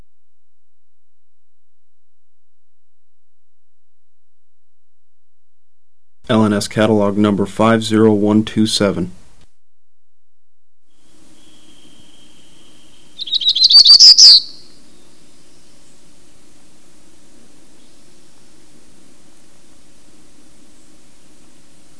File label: Black throated gray warbler, calls